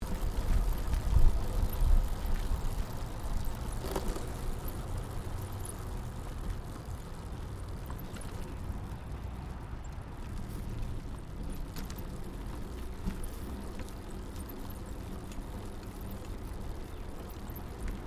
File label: Bicycle Riding Smooth Concrete Pedal Slow Stereo, 21 speed road bicycle; Giant Crx3; Bicycles